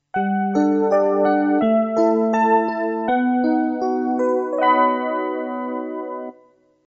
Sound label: jingle